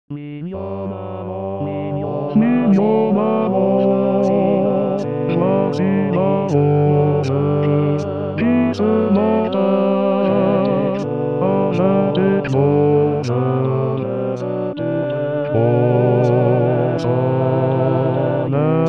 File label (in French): Baryton; + 3 Voix